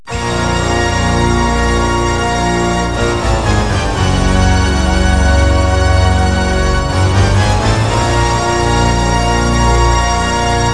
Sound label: overture; valve